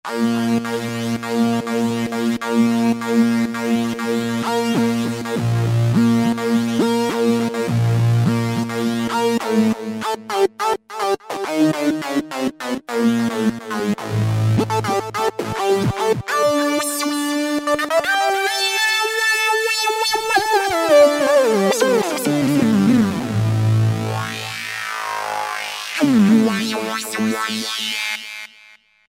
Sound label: HiPass, Roland SRX-05 Supreme Dance